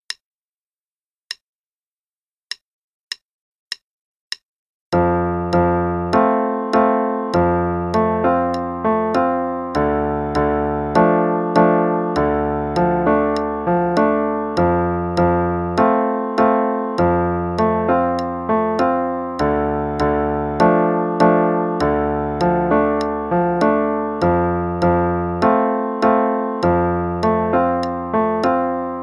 Improvise in F Major, qn=100; Level 2B; Let's Improvise in F Major (4x; Faber Improv

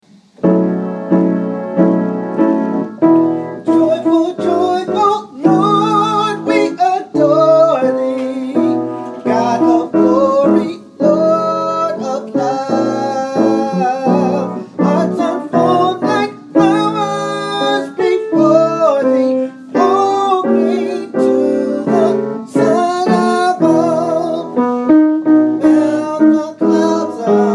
alto

